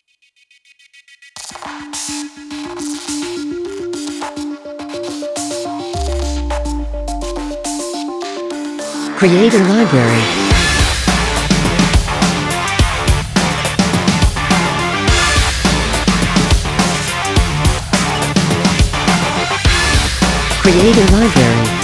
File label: Drum Bass Breakbeat